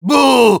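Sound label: jeers, Spanish; Medic; "¡Booo!"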